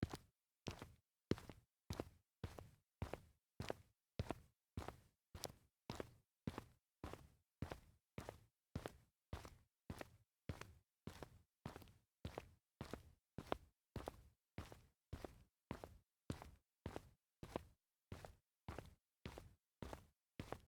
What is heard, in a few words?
sneaker walk concrete